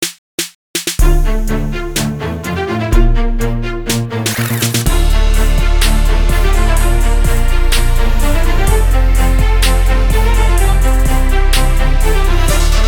STRINGS

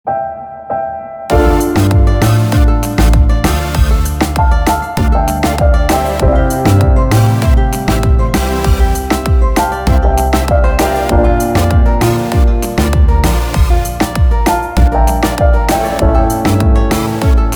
SECRET DANCE (INSTRUMENTAL)

